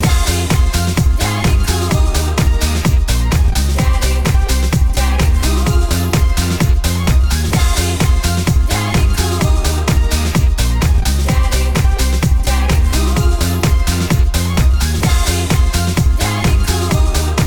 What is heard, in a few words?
ringtone